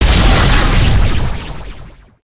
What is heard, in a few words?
Spear Throw; weapons; aronwk collection; ndaudio